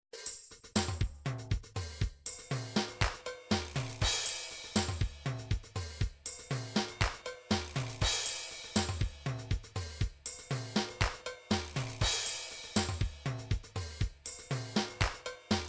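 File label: drums pattern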